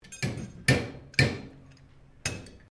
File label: FARRIER HAMMERING HORSE SHOE ANVIL, Horse being shoed; Farms